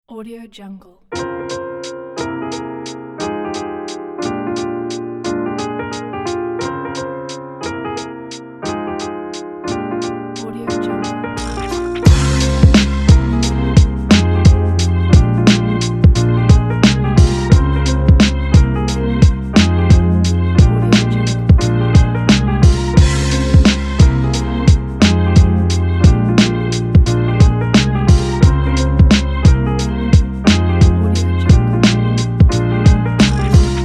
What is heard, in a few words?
jazzy hiphop; arachang